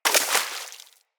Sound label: Bullet Impact Debris Water; Weapons